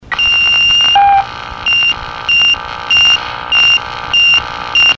plectron

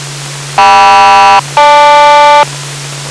heliport; Heliport (LNHM); tone codes; LA COUNTY

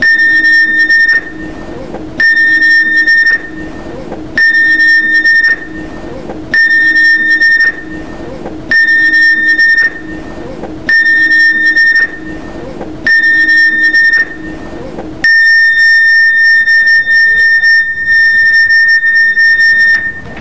lifeboat; US Commercial passenger vessel - General Emergency Signal. This is what is sounded on a cruise ship; or a cargo vessel

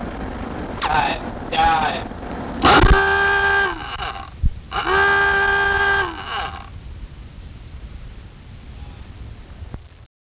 682 DIVE

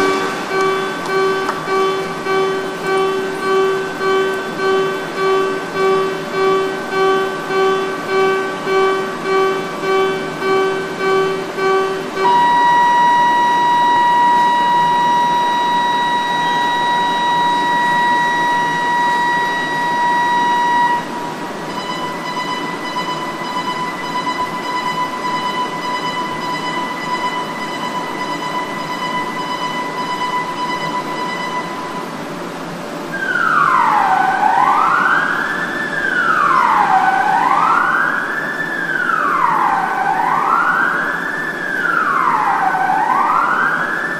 alarms; Surface ship - Alarm test during commissioning of USS Gerald R. Ford (CVN-78)

